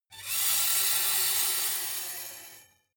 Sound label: metal screech phaser steady short